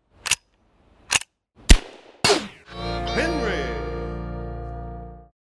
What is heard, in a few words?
Lever Action Shot Ricochet; Henry